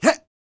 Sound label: A YELL, MALE HUMAN; ILIO; Vocal Planet